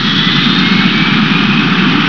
jet boost; TRANSPOR

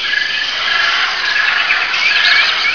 JUNGLE

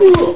punch

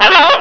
parrot; ANIMALS

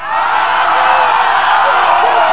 CROWD

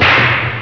BALL; IMPACTS

